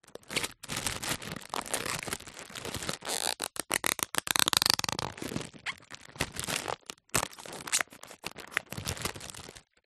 foil birthday banner handling rub with finger squeaks